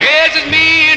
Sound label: yes indeed SUNG